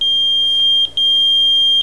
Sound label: beeper